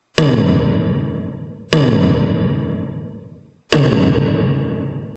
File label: cutaway